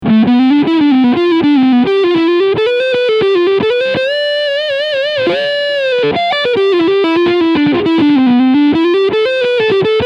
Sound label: Leadsound Front PU